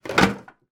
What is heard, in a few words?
pop up pirate game barrel put in box